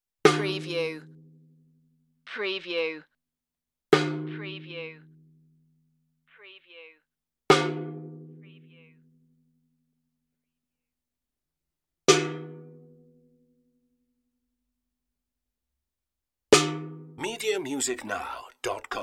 sheet metal tin hits

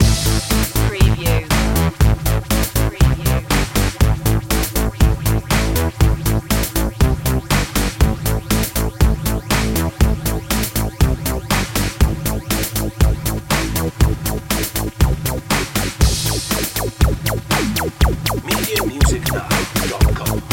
best happy uplifting loop

